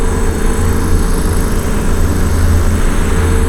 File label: Poly Hover; HoloToolkit-Examples; SpatialSound